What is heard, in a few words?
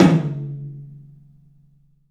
TOM, 3D Drums, CLUB TOM; 3D Sound